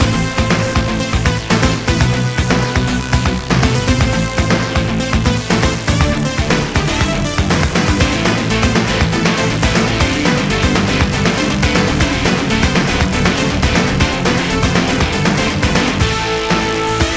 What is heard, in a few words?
Boss B Loop; Blazing Chrome